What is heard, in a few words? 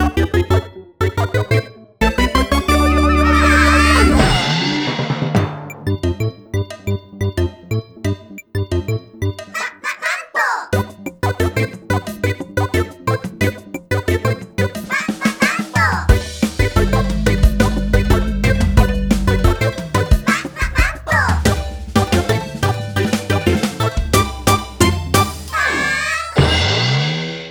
Ocarina